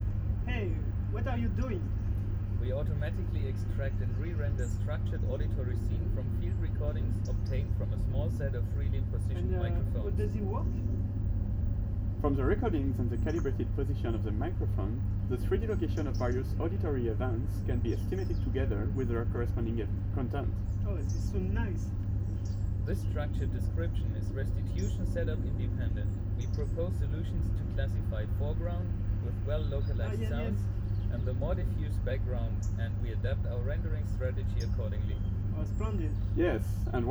ambi Outside

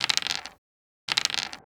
Resynth Wood Roll

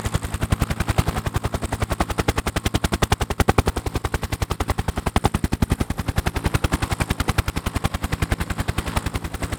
rotor

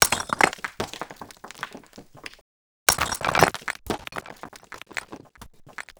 Stone Break; Resynth

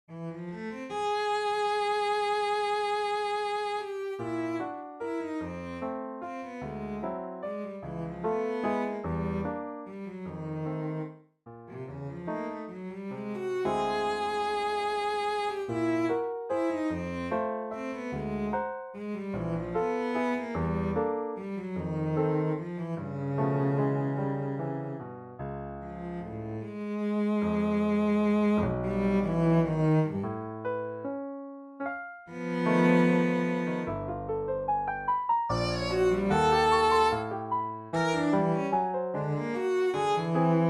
cello

